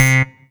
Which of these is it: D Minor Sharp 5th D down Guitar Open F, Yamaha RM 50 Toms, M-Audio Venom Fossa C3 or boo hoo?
M-Audio Venom Fossa C3